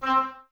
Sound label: OBOES C, Oboes, Wood Winds; Miroslav Vitous; Woodwind Brass Ensemble